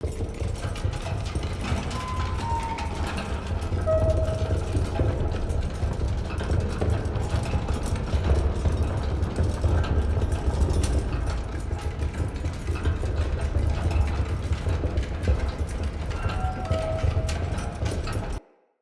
Elevator Loop; UnderCity

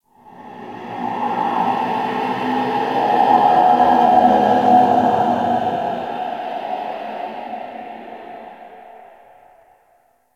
Ice Cave Wind; Warframe; Corpus Ice Planet; Ambience

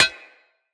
Bullet Impact Armour; Weapons

